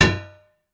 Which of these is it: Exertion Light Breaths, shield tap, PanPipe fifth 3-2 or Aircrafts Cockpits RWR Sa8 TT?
shield tap